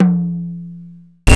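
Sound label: drum hybrid; Roland LCDP14 Africa VOL-2; Afro Menu 1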